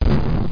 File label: bam; THE REALM